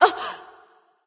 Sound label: surprise; smite; aphrodite